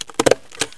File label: staple, office; Stapler 1